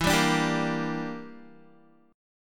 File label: E Minor 7th Flat 5th E, Guitar; Em7b5; strummed; 12,10,12,12,10,x